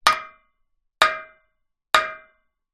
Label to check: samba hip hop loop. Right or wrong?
Wrong